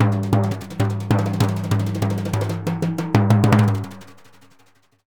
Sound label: Synclavier Percussion, World & Orchestral